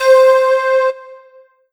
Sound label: Roland SC-88 Calliope Lead C5